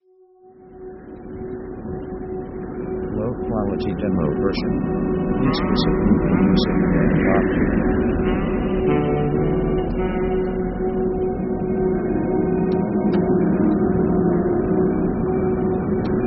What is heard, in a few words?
ghosttown